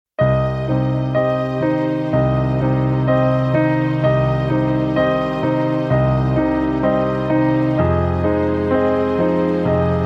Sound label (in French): Ballade d un soir piano